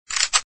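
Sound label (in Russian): перезарядка пистолета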